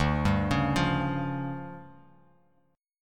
D Minor Major 7th Double Flat 5th, D, Bass Standard; DmM7bb5; arpeggiated; JGuitar